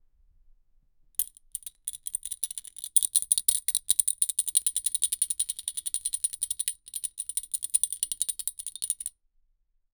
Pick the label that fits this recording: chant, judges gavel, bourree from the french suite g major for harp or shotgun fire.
judges gavel